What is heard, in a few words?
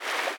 fast water, river sounds